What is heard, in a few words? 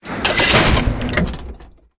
torpedo launch; tanks; War Thunder